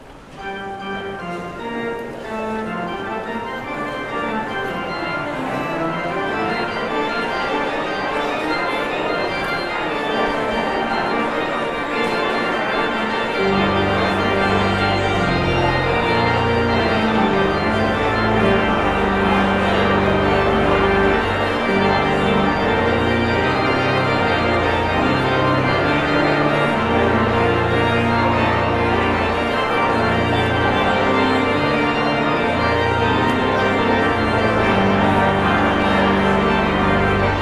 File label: Postlude